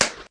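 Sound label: slowdown clap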